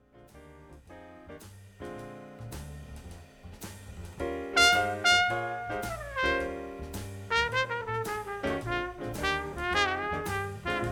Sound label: jazz duet